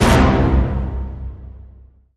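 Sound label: Hit Orchestra Tutti C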